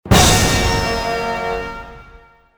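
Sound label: capture the flag win; Soldier Of Fortune II Double Helix; XBOX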